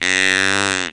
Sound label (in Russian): zvuki belokhvostogo olenia